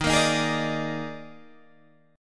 D sharp Major 7th Suspended 4th, D sharp, down, Guitar, Open G